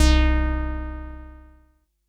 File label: SYNTH